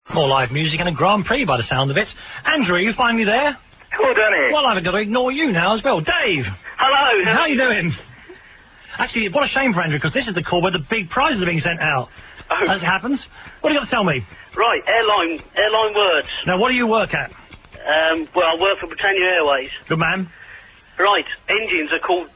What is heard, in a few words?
hum